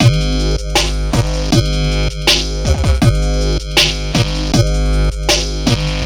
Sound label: Modulation